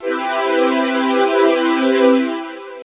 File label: G, Drum & Bass Pads; Total Drum&Bass; 90 s Sample CDs